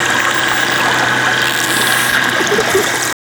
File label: ECHO, wild dolphin